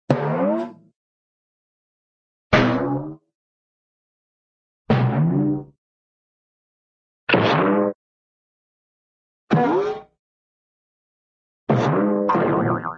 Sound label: hit, cartoon timp rise